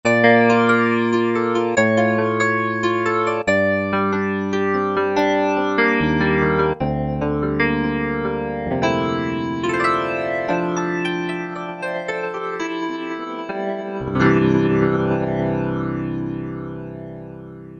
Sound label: Flanged EP